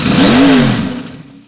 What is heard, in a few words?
vehicle start